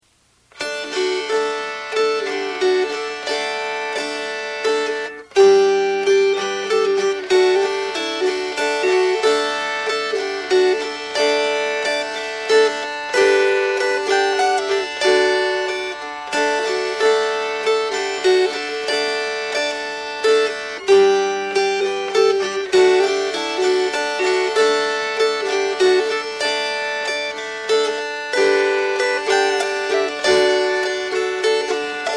Mazurka

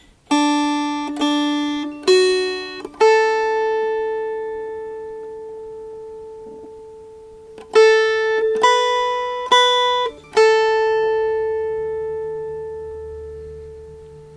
Melody

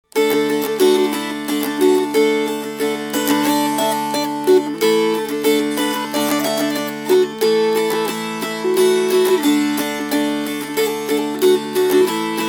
Fast

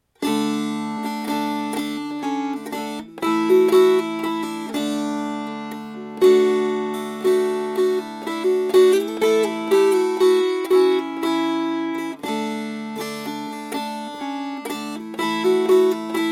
Strummed; Holy God, We Praise Your Name

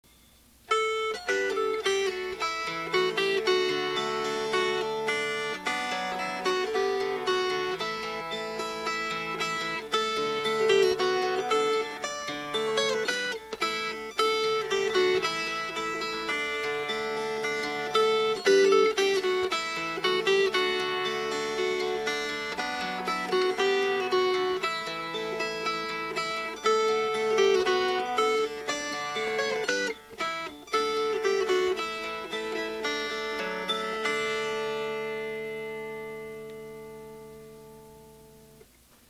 Fingerpicked; If On A Quiet Sea

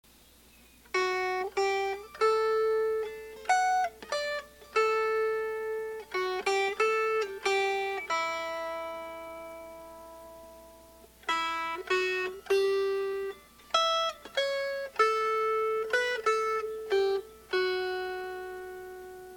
Melody

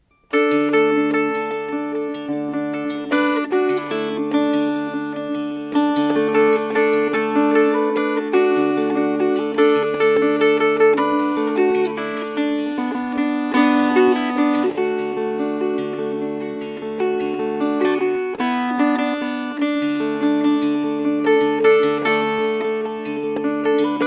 Fingerpicked

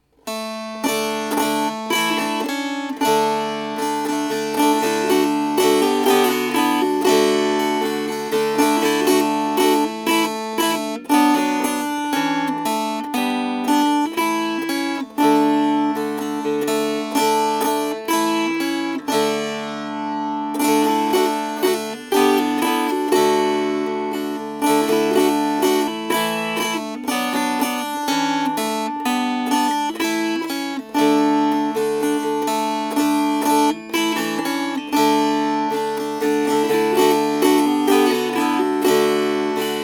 Strummed

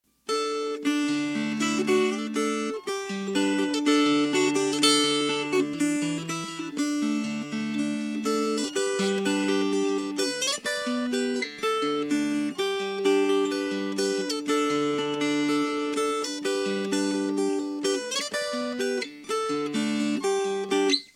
Fingerpicked; Jam on Gerry's Rocks

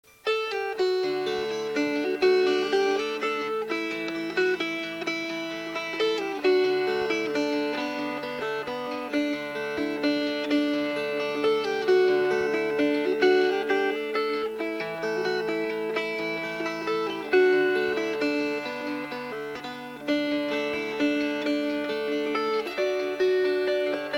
Southwind Fingerpicked

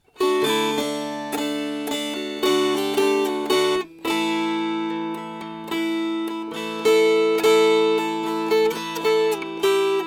Strummed

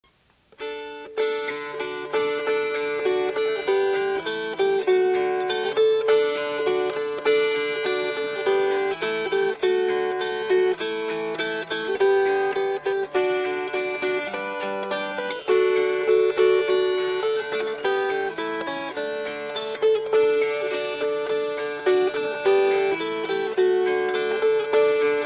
Fingerpicked

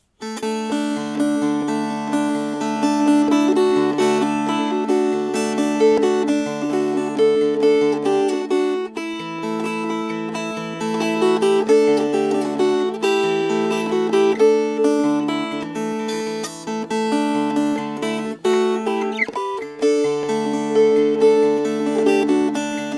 Sheep Shearing, Fingerpicked

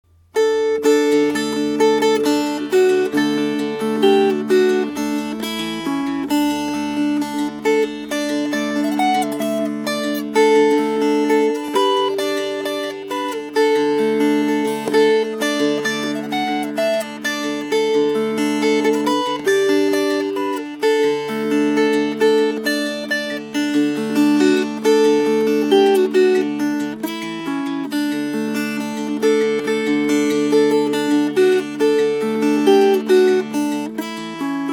Fingerpicked; Johnsons Motor Car

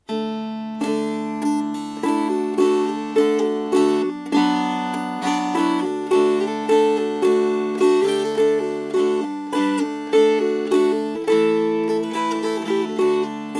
Strummed

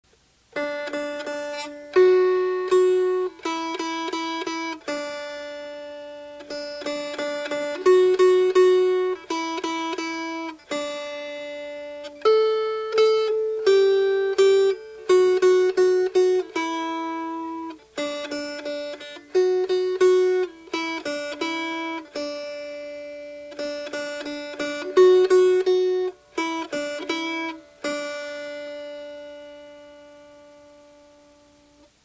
Melody

